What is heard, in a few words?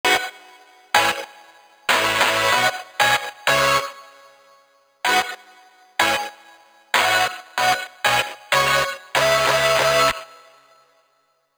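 Saw Lead Fx, 95, G minor, Tail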